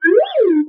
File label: Slide Whistle Up Down Fast